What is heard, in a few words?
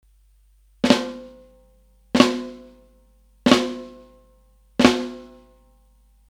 Snare Flams; Kirchhoff Test